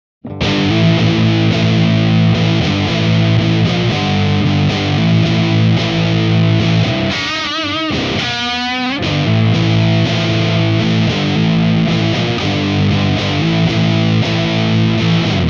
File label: GTR GAIN 75